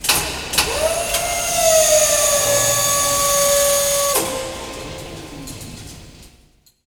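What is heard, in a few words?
elevator servo, science fiction; E-MU Producer Series Vol. 3 – Hollywood Sound Effects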